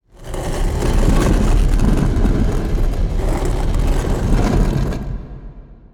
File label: stone gate open